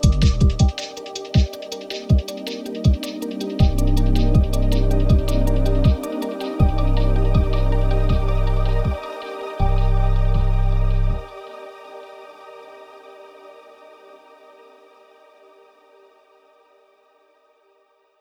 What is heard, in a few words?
Drum Echo; BB 2HTC Samples Vol 4; Weird